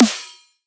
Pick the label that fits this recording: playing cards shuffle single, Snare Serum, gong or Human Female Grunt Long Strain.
Snare Serum